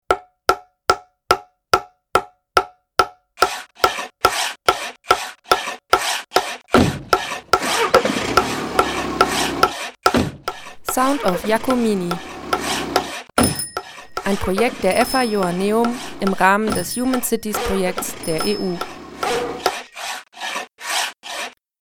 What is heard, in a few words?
Jingle